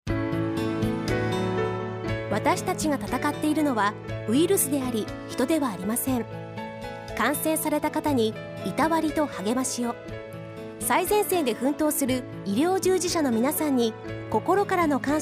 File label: yell